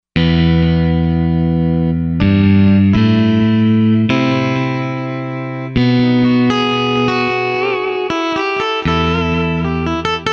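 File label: Guitar Lo Distort, synth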